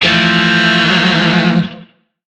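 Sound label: Shawzin Major Chord; Warframe; Lizzie Shawzin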